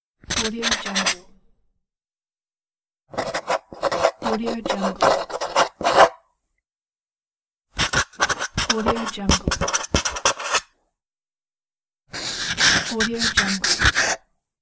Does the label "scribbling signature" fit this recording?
Yes